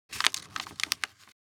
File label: Paper trash rustling garbage